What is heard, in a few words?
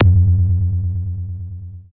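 TONGUE, DRUMS & PERC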